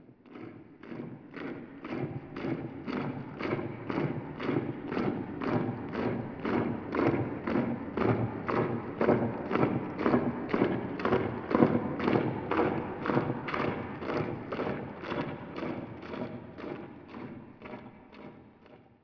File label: marching distant; streetwar; hl2